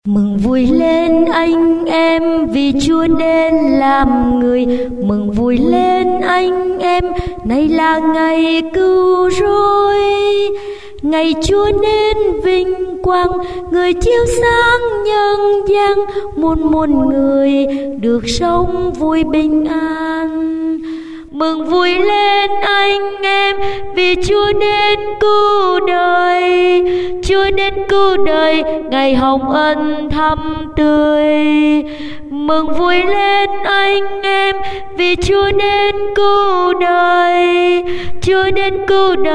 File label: alto baso